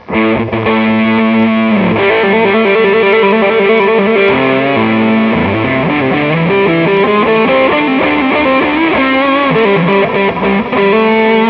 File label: guitar; svencoop